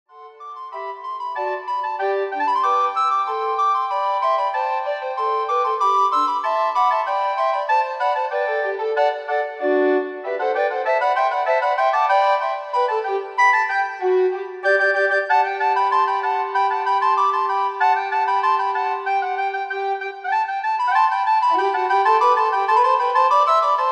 Anon Medieval English Dance

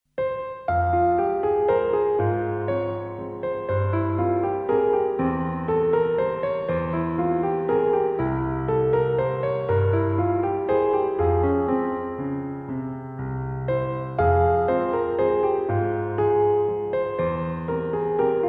Melancholy Waltz

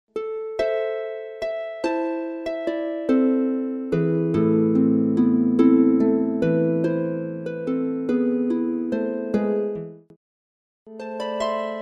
Siciliano for Celtic harp

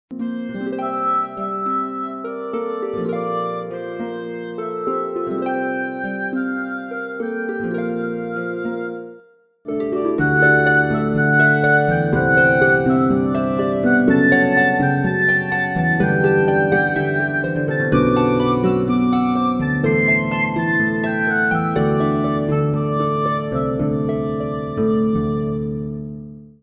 duo ob hp

